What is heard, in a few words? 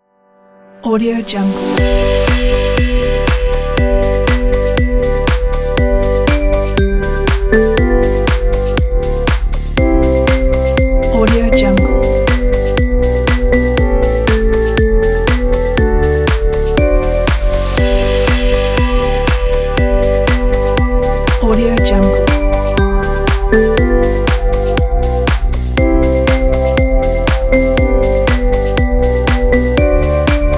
jingle bells christmas dance